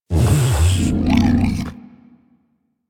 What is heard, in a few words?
Vocal Breathe Fast, critter; Warframe; Hunting Critters; Undazoa